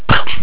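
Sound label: warp sound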